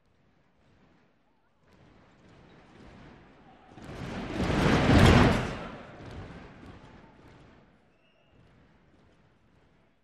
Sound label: Arena Crowd Foot St D, sports arena